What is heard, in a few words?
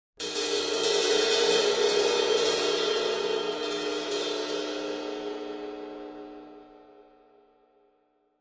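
Cymbal Large Trill, drums cymbal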